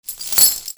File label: FALLING